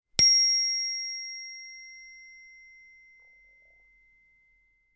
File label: Chimes